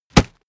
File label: punch mid tight